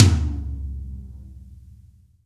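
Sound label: tom, acoustic toms; Roland - Rhythm Section; Real Toms 1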